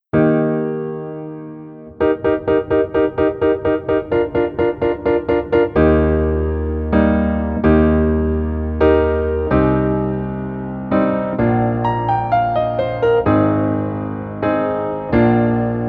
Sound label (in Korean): Piano ver 남자 키